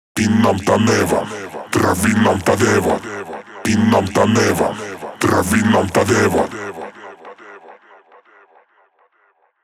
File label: 138 Shamanic Voice (Wet), Psytrance